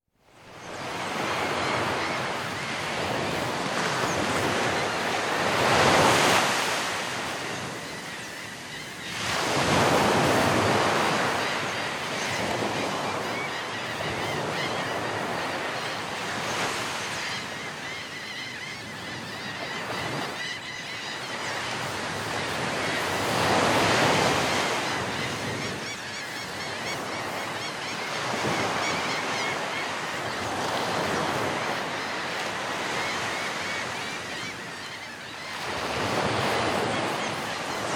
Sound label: Northern California Coast; Dr. Sound Effects; 210 Nature Sounds - 20 Hours of Relaxing Natural Ambiences for Meditation and Sleep